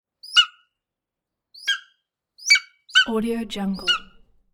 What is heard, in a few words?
eagle call begging